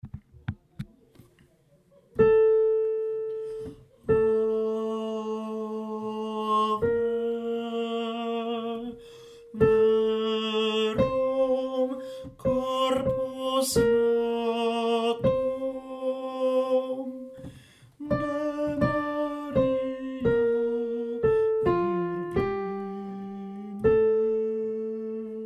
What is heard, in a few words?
TENOR; Ave Verum; Penalosa